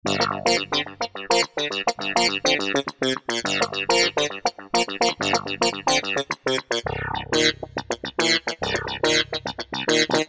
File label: Dino Wah Clav